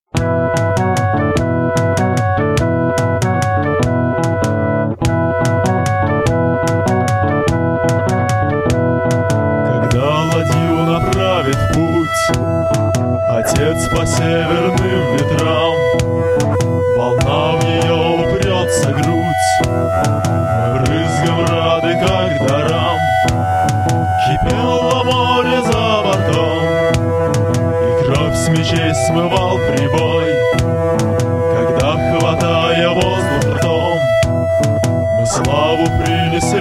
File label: hymn